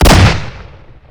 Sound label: shotgun fire